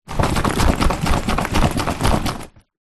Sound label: foley suitcase empty shake short